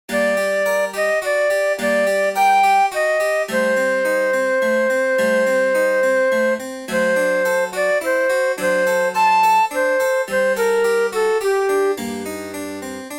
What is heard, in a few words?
Siciliano; j s bach